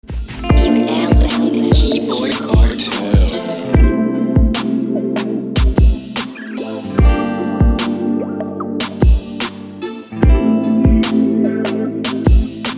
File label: Keyboard